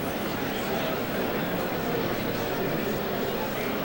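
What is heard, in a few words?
BMX Crowd Ambience Idle Loop; Crowds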